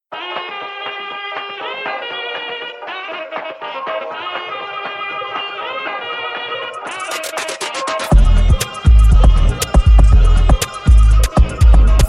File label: Love Groove Trap Dancehall Instrumental